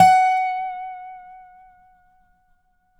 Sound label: DOBSUSF#5 -L, dobro notes; Country